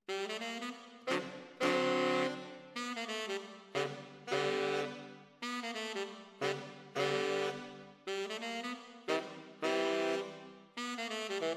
ORTF SCHOEPS, piano

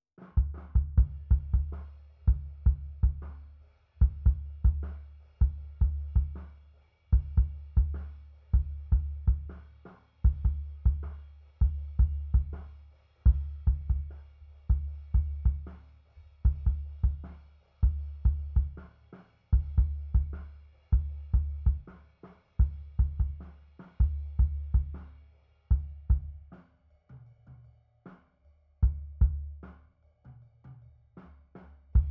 Kick SUB

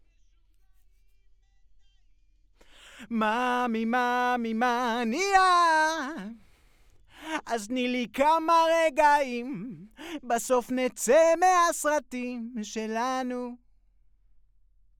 VOX

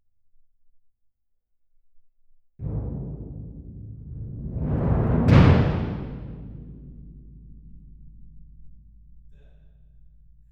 Twin R Perc

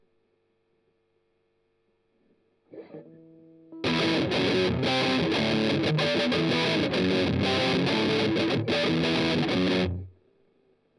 Strum Octav

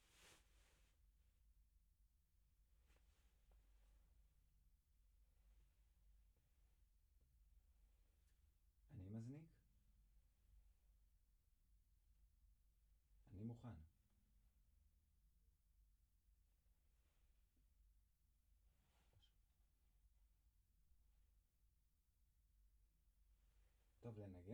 Piano Low

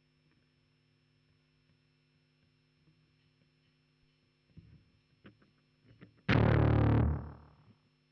Bass DI

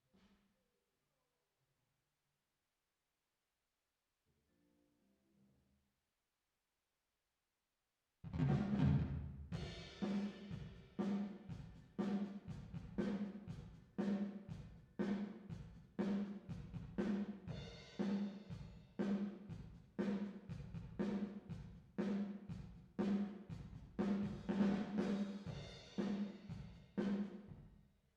DRUM VERB

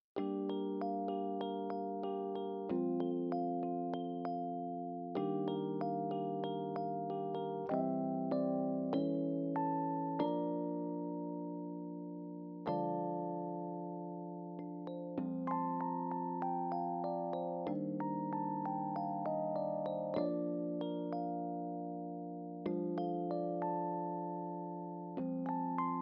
Rhodes

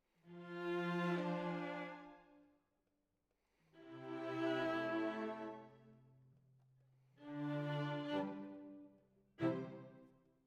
Violas, jazz for piano

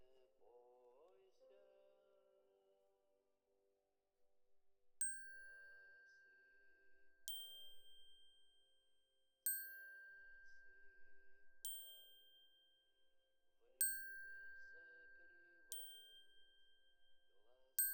Glock Close

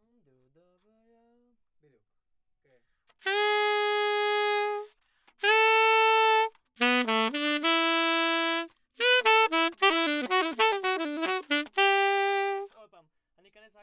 Sax; Gefell

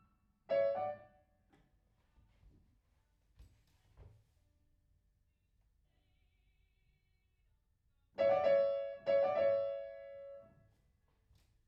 Piano Room

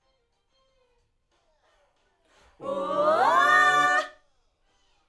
Backs AKG

